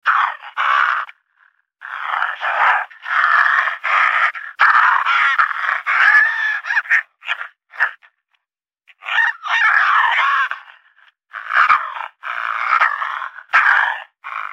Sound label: Raccoon And Fox Fight